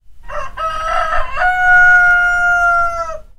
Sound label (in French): Chant Du Coq